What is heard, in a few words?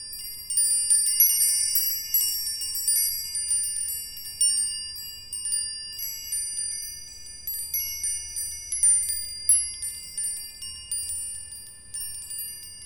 Seamless Rod Loop